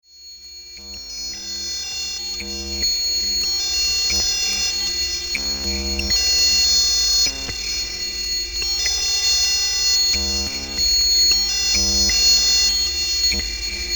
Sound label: Bankrupt alarm